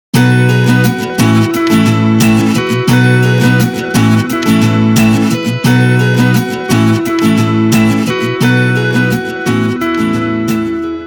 Nokia C7 Digital

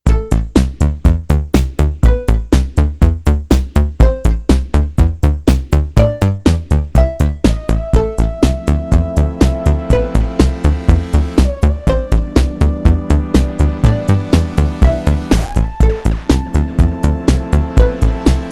ringer disco drive, ringtones; ZTE Open-C

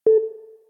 brilliant ring lock, Samsung Galaxy S7

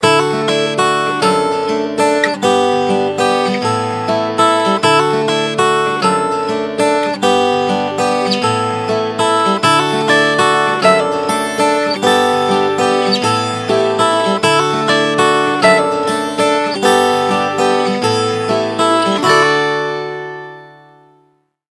ringtones; LG G3 A

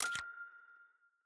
Unlock, phone ui; Razer Phone

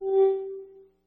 Ding, phone notifications; Goophone Y5; iPhone 4S clone; Chinese clones

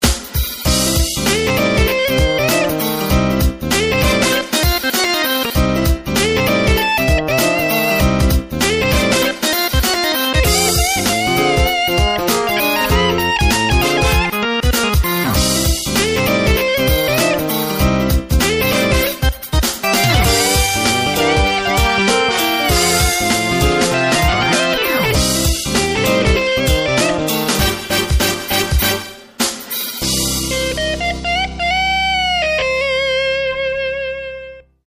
Blues High; LG; UX700 Bliss

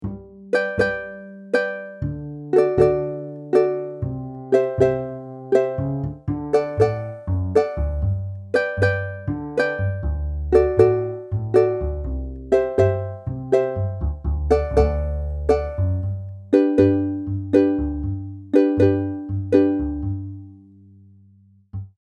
Ukulele, phone; LG

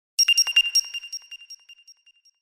Buzzer; Alcatel; OneTouch-V985N-2AVDPT1; alarms

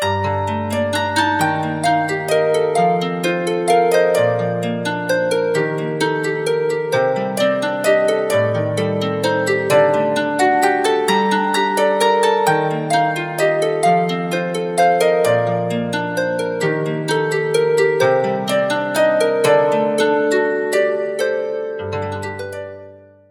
harp vox, alarms; ZTE nubia Z20

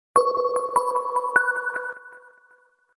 Shimmer

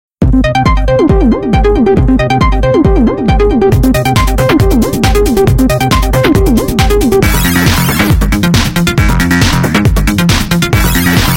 Digitize, phonetones Nokia

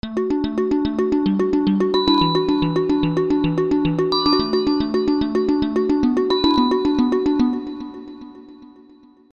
Bellarina, ringtones

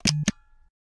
Old Camera; Samsung Galaxy S22 SM-S901U; Retro

